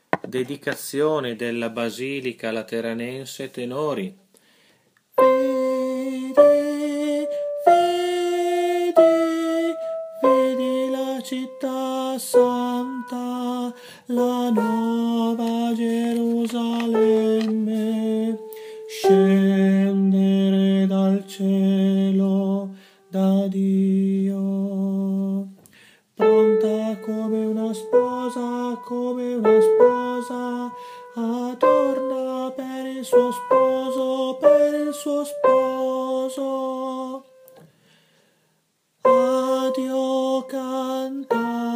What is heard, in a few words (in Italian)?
Tenori; Dedicazione Basilica